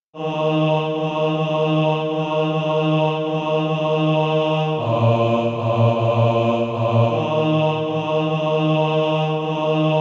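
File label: bass, SATB Practise Music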